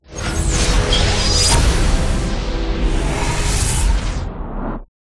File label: Logo